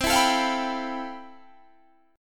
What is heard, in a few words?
F Major Sharp 11th C